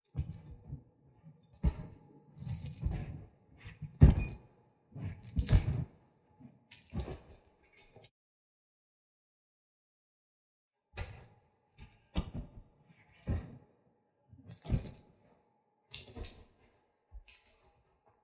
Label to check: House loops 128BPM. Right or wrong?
Wrong